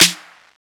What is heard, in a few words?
snare one shot big crunch; Snares